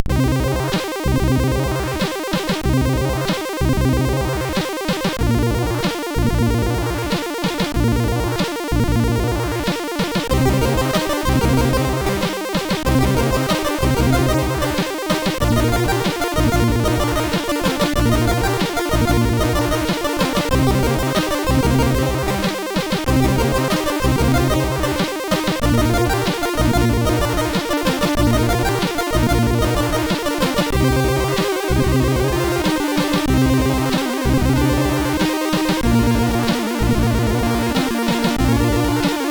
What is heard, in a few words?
Crackle, SID tunes